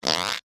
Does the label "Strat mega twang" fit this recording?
No